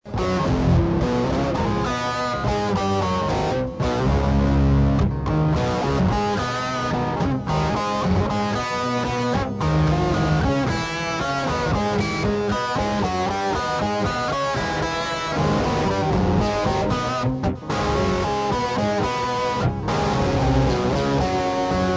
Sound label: guitar bit; Newport,OR (April 2005)